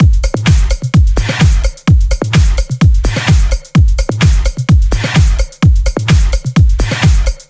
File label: 128BPM Kit; Vengeance Samples; Fatman Kit